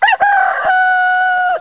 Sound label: Rooster